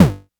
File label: Blippy Kick, Lo Fi Drum; Kicks